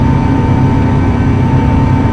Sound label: tank boost; Tribes2